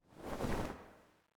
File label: Bag close; UI; Illusion UE5